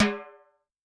Timb L, Yamaha DTXpress-II, Perc

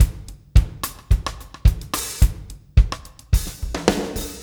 PULL STIK, Backbeat, PULL UP; Spectrasonics

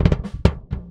Doublekick Loop; Airwindows

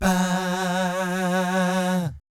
VIB FX, Vocal Planet Jazz & FX; ILIO; JAZZCOMBO

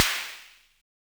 Snare, Univox SR-95

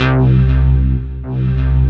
C2, SynthLine; 90 s Sample CDs; NiceBeats; SynthLine VOL-2

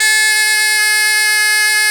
BOMB1 A2, BOMBARDE1, Celtic Flavours; USB Soundscan vol.42; AKAI

